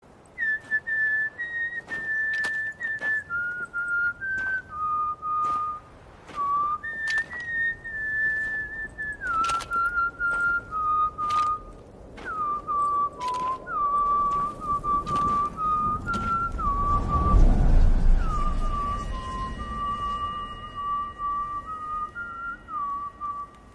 Whistle; Witcher 3

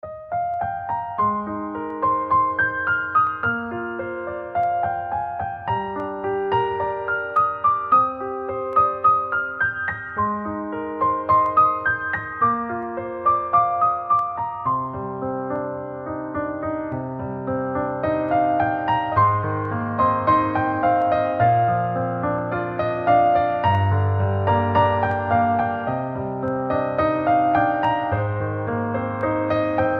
Beautiful Piano

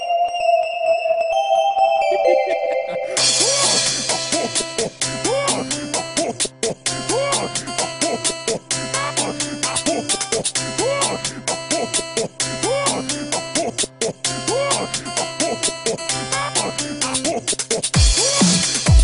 merry christmas mix

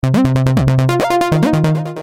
Cool Sms Tone

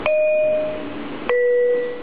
metro north dun dun

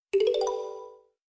Chime Sms

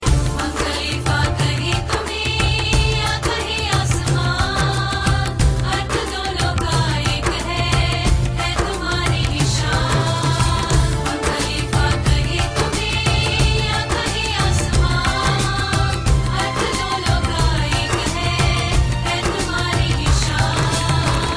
Indian Song; Uae 40

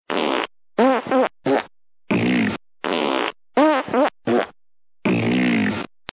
Farting

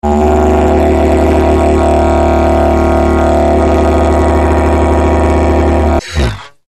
Didgeridoo

